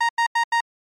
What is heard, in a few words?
cockpit alert